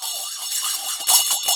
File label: BO RUB; INIS - Opium; PERCS-KIT